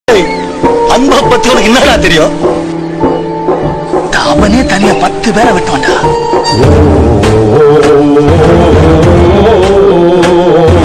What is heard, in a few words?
trending bgm tamil; vada chennai